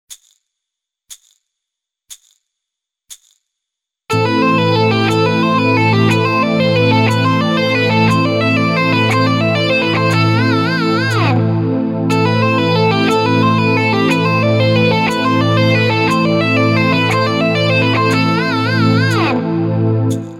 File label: Metal Legato